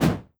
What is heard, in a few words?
swish